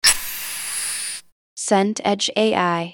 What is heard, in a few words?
match strike